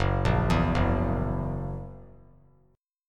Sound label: F sharp 7th, down; arpeggiated; JGuitar